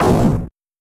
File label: explosion; tictactoe